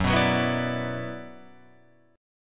Gb-7th Suspended 4th Sharp 5th-Gb-6,6,6,6,7,9-1-down-Guitar-Open F; strummed; JGuitar